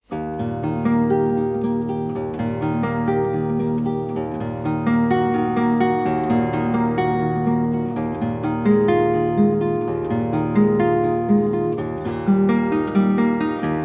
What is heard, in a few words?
Four Miniatures Nocturne, guitar